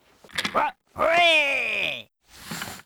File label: green win arms; netent